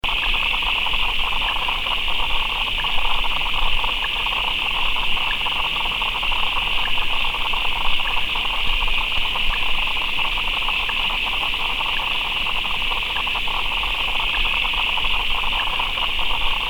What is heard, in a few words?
frogs Street; Stodart